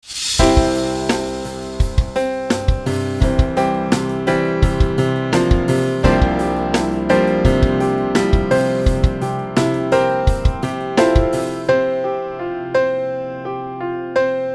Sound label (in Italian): suoneria cellulare; campagna